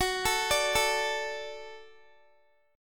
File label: F sharp Minor Sharp 5th F sharp Guitar; F#m#5 arpeggiated